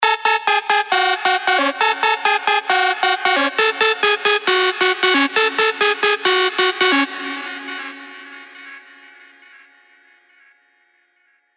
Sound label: Speed Flash, big room techno drops; Revealed Serum 2 Big Room Techno Drops Vol. 1